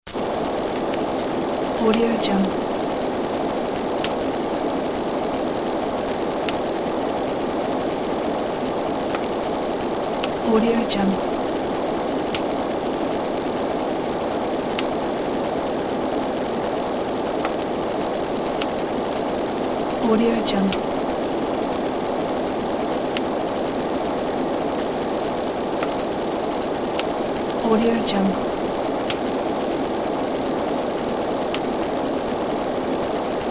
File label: background noise